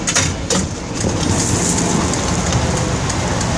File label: Doors Opening